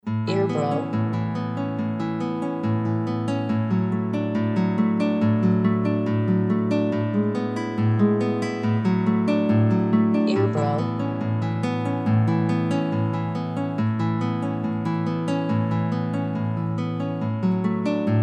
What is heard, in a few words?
Nostalgia Guitar Ver